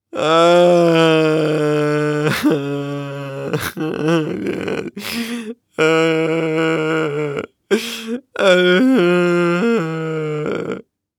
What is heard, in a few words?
Human Male Crying Whiney Sob Long